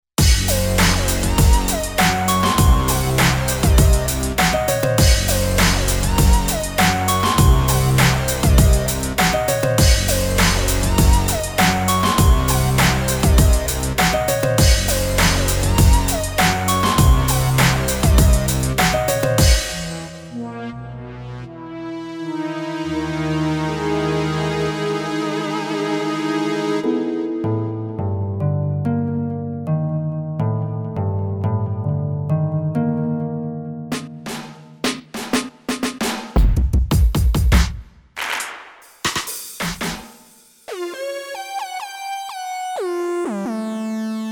Hip Hop

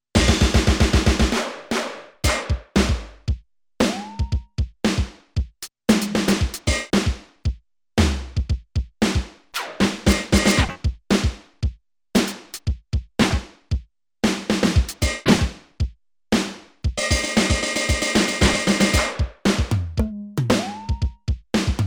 Drum Kit, synth

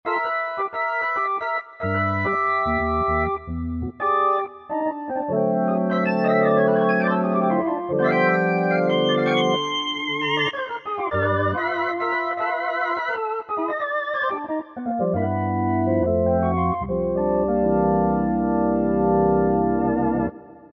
Organ 1, Korg M1; Factory preset examples

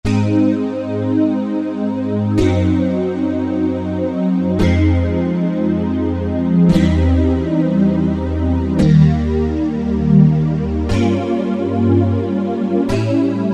Bee Hive, Korg Wavestation A-D

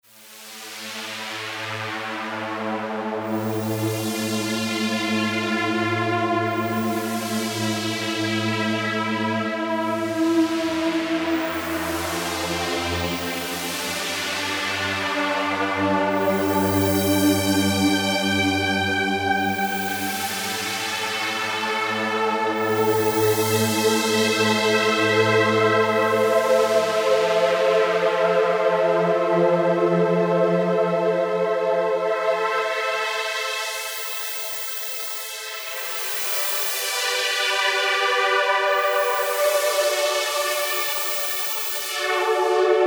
synth textures; Casuarina Cascade; Apple GarageBand 2

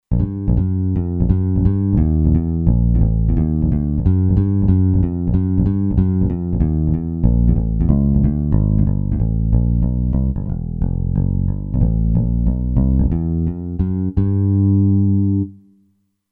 Warm Bass, Kurzweil K2500X; Factory Programs

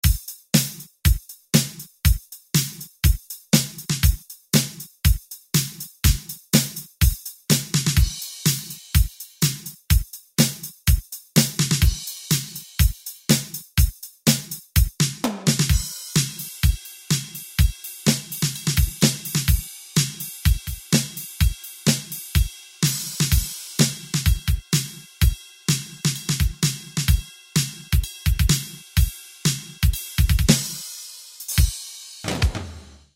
Punch Gate Kit, Kurzweil K2000; Kurzweil K2000 Contemporary ROM

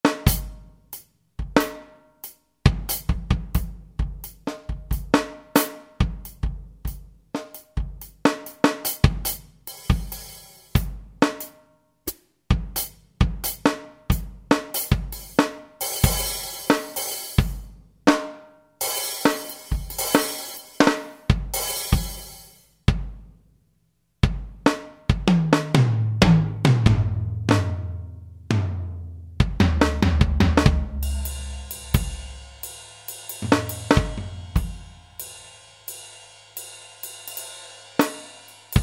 Studio Kit, Real Drums; Yamaha A4000; Kits

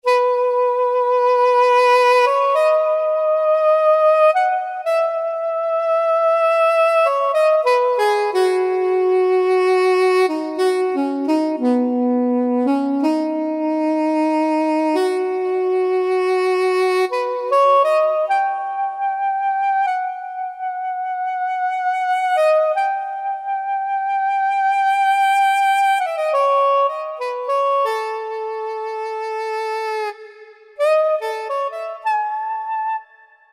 Soprano Sax, Yamaha PSR-3000; Factory Voices; Saxophone

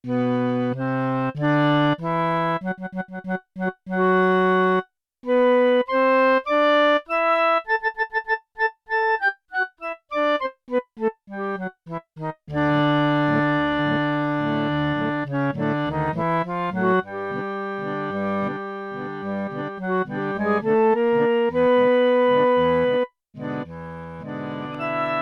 3-Octave, Bb Clarinet and Clarinet, Yamaha TX16W

